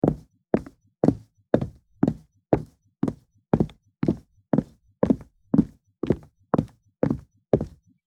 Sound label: Wind Footsteps; horror; horror sfx vol 1